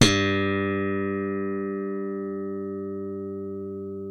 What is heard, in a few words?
G2, slap thumb; Xsample VOL-1